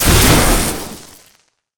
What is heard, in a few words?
thunderbolt; battle